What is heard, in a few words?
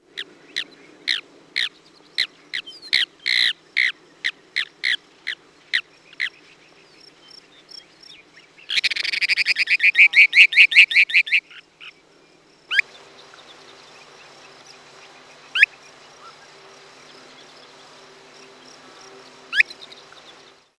mountain plover